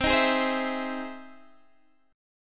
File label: E Augmented Major 7th C